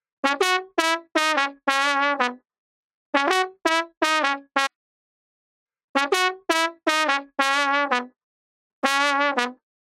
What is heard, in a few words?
brass trumpette